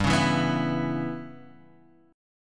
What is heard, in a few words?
G 6th Flat 5th, Guitar, Standard; G6b5 strummed; JGuitar